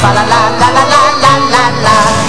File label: Med Box; xmas